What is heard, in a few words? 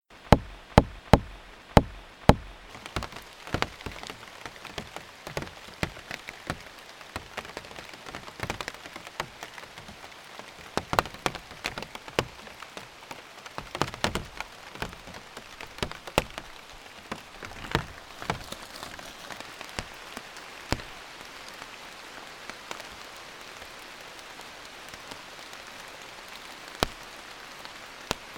sounds of rain on leaves